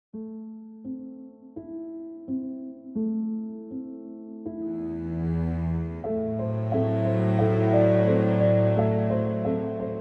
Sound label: Strings; labs strings; Lights